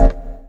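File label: organ, Stabs; E Lab; XTc Files of House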